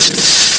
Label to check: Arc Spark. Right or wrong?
Right